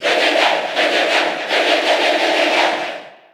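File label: Cheer Japanese; King Dedede; SSB4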